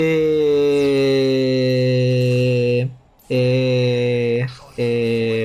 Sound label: ehhh ehhhh ehhhh